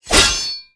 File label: Sword Line; nox